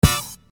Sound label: Horn Hit, Hip-Hop; Construction Kit; Sonic Foundry (Sony Creative Software)